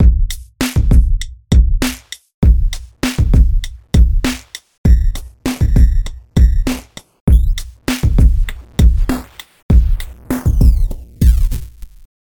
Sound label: LoFi; MASCHINE STUDIO